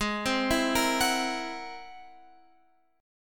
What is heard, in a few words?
Ab Augmented 9th Ab Guitar Open F; arpeggiated; x,15,12,11,13,13; Ab+9; JGuitar